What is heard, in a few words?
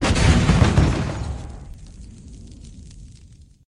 Cruiser Explode; Lethal Company